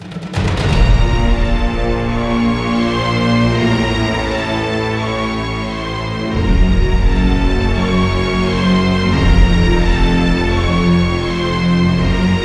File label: Knight Dramatic Fight; Inmost